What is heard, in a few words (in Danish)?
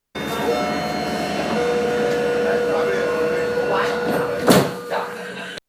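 dd afgang, Afgangslyd DD-vogn; Dobeltdækkertogets afgangslyd; Mads Liisberg, Marts 2017, Roskilde Station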